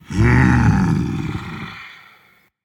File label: Content, Dragon; fafnir